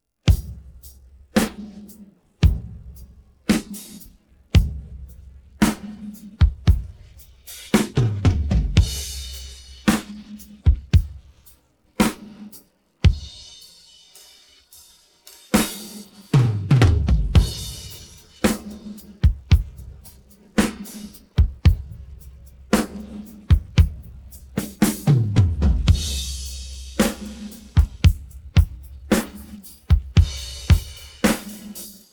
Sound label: drums pattern